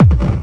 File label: hardbassdrumz, breakcore; breaks; Bazzdrumz